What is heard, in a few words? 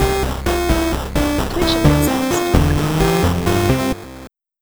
8-bit v2 follower